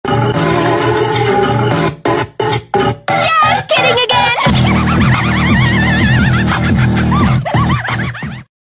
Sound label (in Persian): اهنگ خنده ترسناک; witch laugh; tiktok